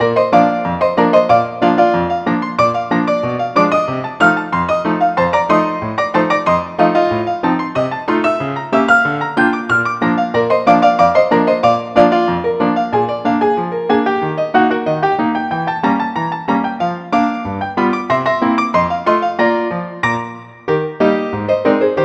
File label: RAGTIME; 3800 MIDI Magic